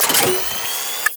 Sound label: Minigun Fire Charge; Warframe; Corpus; Corpus Railjack; Spec Ops Minigun Agent